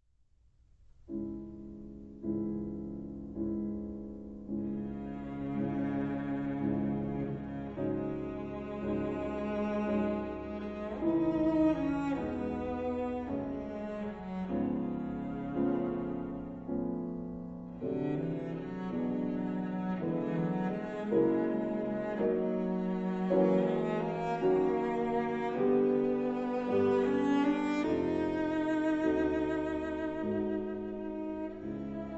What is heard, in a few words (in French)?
Quintette; Quintette en fa dièse mineur, piano et quatuor à cordes; 3. Allegretto grazioso